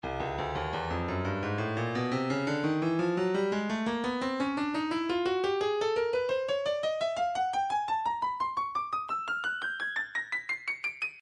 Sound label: breakbeat 086 bpm; man e; Stylus Breakbeat Midi Files